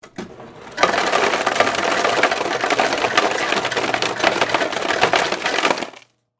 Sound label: Ice Machine Dispense, Hotel; Miscellaneous Bar Sound Effects